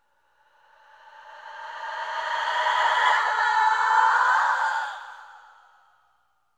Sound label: Ghastly Screams; MinorHallutinationsSounds; SFX Producer